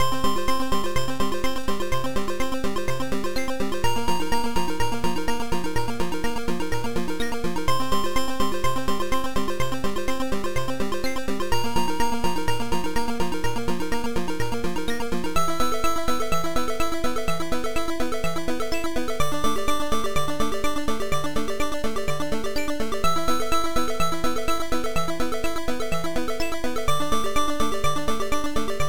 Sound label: Game music; Wopgamma